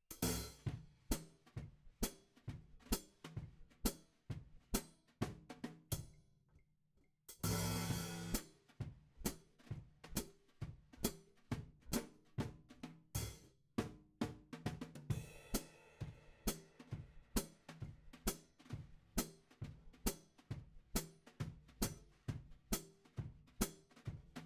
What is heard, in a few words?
Hat